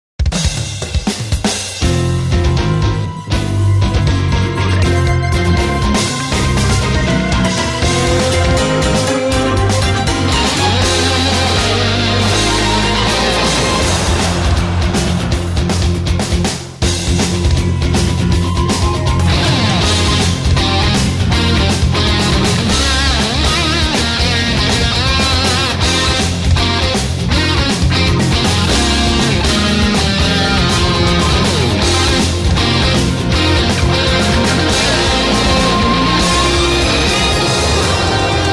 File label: Battle BGM